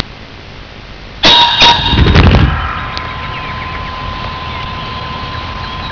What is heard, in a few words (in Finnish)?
fasaani; suomenkielistä